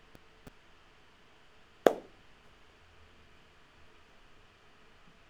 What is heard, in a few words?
Impulse